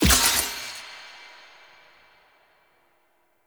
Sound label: Passive Armour Shatter; Powers; Bromius